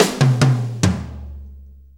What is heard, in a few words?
6-8 Fill, Drum Tools